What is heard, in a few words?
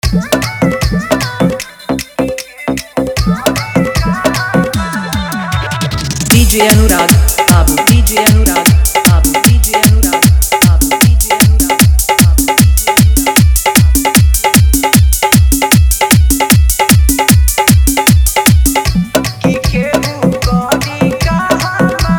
Hard Dhollki Bass Mix